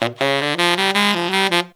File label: 160 BPM, Horns